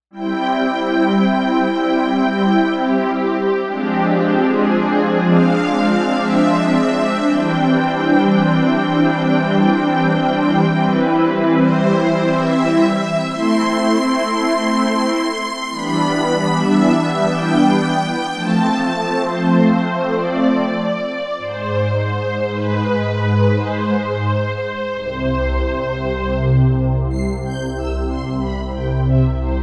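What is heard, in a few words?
Strings Pure Strngs, synth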